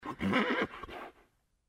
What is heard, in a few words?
Horse Grunt; Age of Empires III